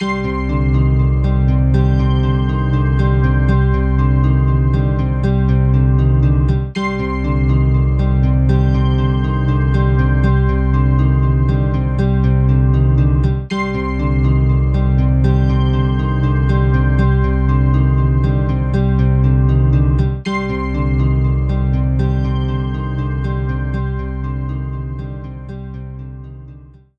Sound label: Lever Puzzle Chest - Short Loop; betrayal in antara; windows